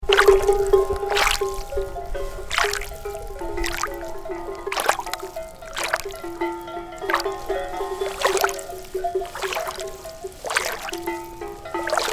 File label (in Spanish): Agua Ganado Anayet